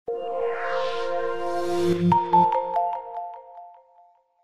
boot Sound; Itel; S23

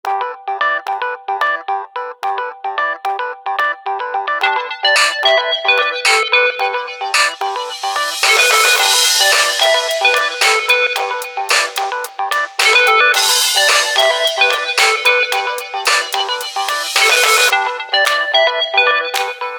tone; Motorola; Atrix-2

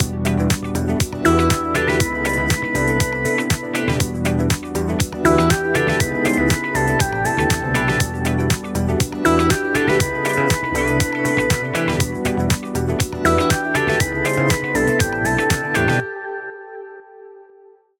Funky Steps, ringtones; Samsung; Galaxy A56; Retro

